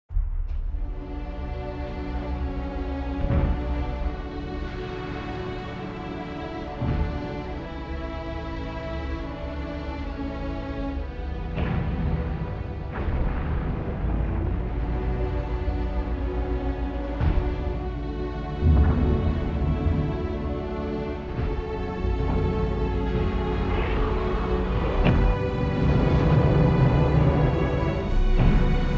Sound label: intro music